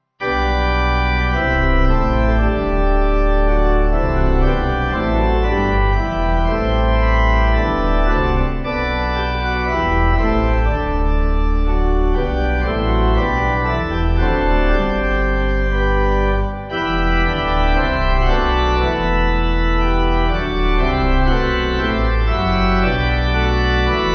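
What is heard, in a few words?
Pipe organ, church music backing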